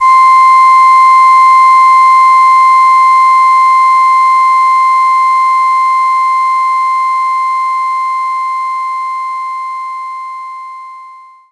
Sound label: flute C5